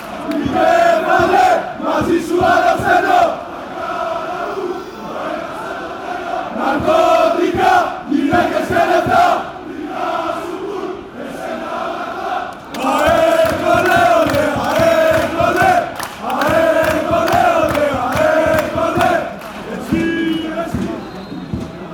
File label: aek athens chant